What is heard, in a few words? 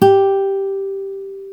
GTR Nylon, Roland; NylonMartin; Club-50 - Foundations